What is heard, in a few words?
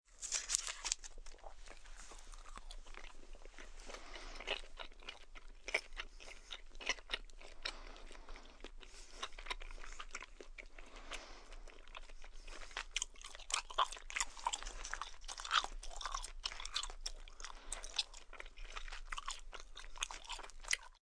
Eating Squishy, Eating chewy food, or gum, long; (human voice); Voice Box